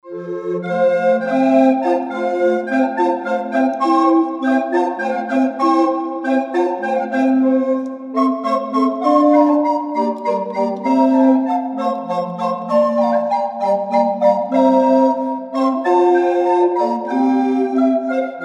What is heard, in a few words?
Hornpipe